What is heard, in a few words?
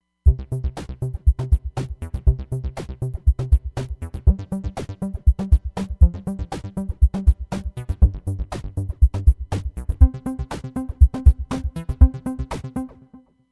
Insta Groove